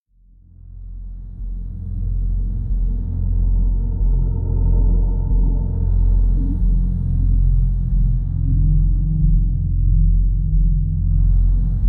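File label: Drone Infection (F); Brand X Music; Brand X Music Catalogue - Editor's Essentials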